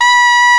gralla B5; SoundBytes - Early Patches

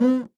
Open Cuica; Zoom RT-123

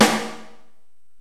STEREO DRUMS

